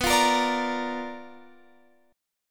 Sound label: B Minor Major 7th Flat 5th, chord